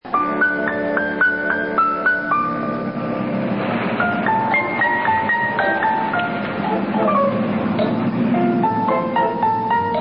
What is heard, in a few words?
piano music